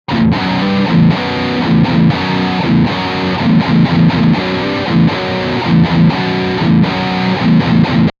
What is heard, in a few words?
NECK drive; eclipse active; line6